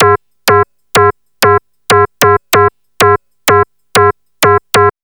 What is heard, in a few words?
LOOP, DRUMS & PERC